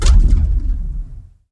movie, impacts & hits; Techno Trance Essentials

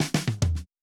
70 s Fill 108 bpm, drums; Basement drums vol 1

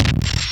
PULVERIZED, PHUZZ DRUMS; Spectrasonic Distorted Reality 1

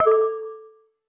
U220 FX Set, Drum Machines; Roland U220

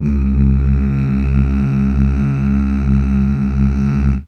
MMHH

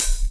DR. LOOP; X-Static Goldmine 3

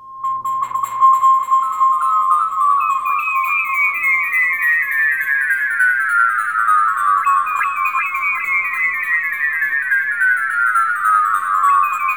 DESCENDING; Zero-G Ambient 1; DESCENDINGS

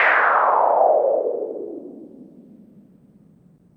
Sci fi

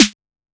Snare, 606; classic machines

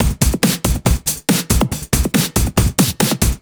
Break Beats, 140 BPM

